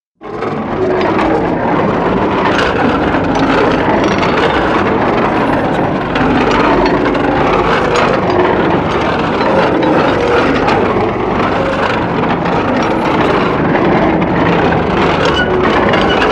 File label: mechanical heavy sound